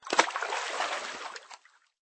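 falling water, diving, swimming, sport, floating drop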